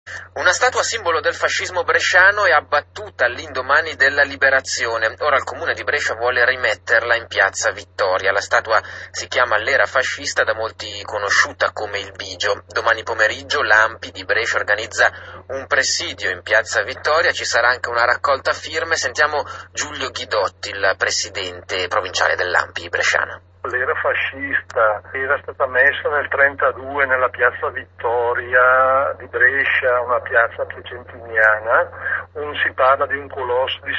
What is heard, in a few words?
Radio pop